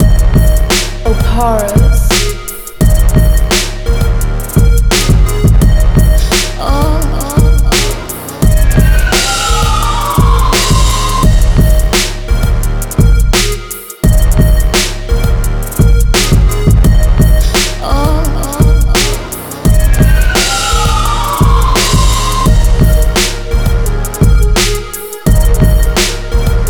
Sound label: 85.5BPM, instrumental